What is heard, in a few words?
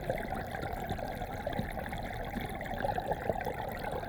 Waterway E Dark